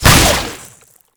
Alt Fire Tether; Weapons; Warframe; Tenno; Oraxia

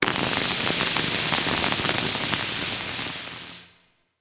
Firework Launch; Warframe; Fireworks

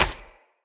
Flock Attack Hit; Powers; Fey

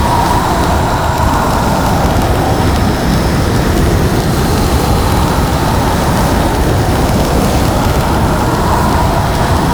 Void Dragon Firebreath Beam Loop Poison; Enemies; Abilities; Warframe; Duviri

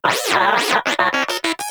Moa Inquisitive Vocal Enemy Killed; Pets; Warframe

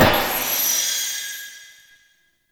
Echoes Spawn Machine Item Spawn; Ambience; Gameplay; Warframe; Entrati

